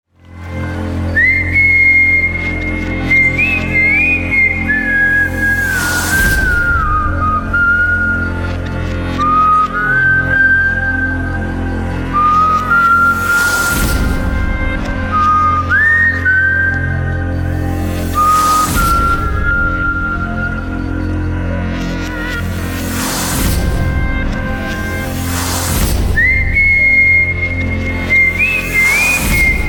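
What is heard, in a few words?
Whistle Music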